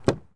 Truck Dodge Ram Door Open; Trucks

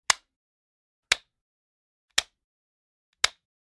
Generic Button Plastic Small

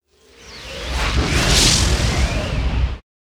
explosion; NCH Library

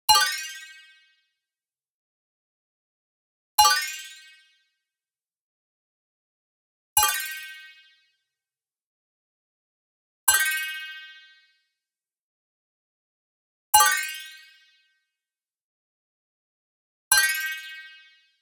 bling, shifted metal cup ping; boom library; Anime Essentials; DSGNMisc

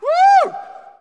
Hockey Male Woo; Hockey Vocal Sounds

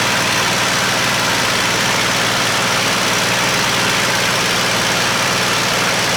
Plane P40 Inflight Loop; SFX Kit; Airplanes

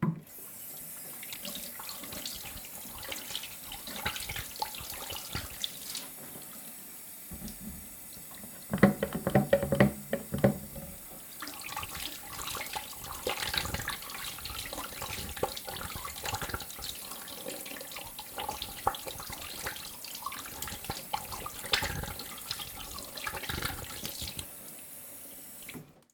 washing hands; human; NS Studios - ultra sound collection

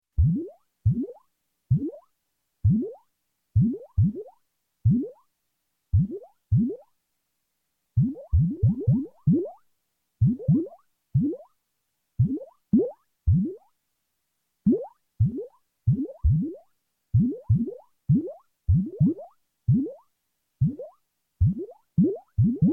Space Bubbles, Bubbling Slow, Electronic; Sound Ideas 2000 Series

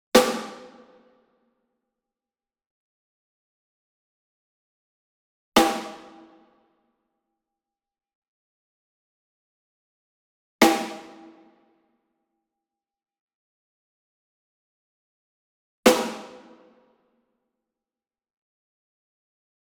SNARE Jarrah Block Hit Rim Medium; SINGLE; Brady; boom library; Cinematic Strikes Construction Kit

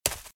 Footsteps Leaves Sprint Right; RadwinIsland

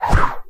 Big Whoosh Quick; SFX Kit; Foley - Misc

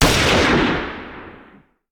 Hand Gun; Sound Ideas; Guns

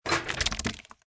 Cage; Blind Quest - The Frost Demon

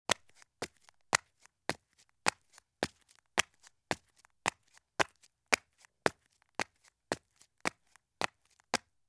Flip Flops Take Medium Steps on Concrete Stairs; Sound Ideas; Footstep Sound Effects

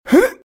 hurt; RadwinIsland

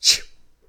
knife; Blind Survival

